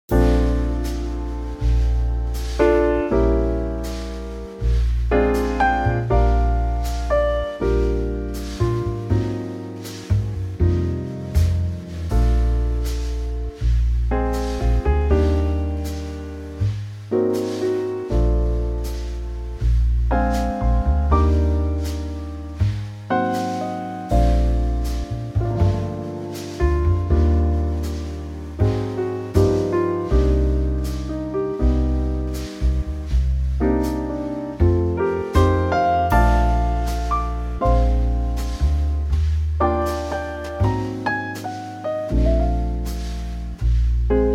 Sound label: Bb trio; key - Bb - vocal range - D to G